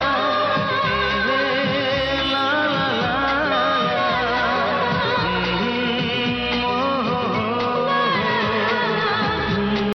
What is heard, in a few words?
Bengali SMS ringtone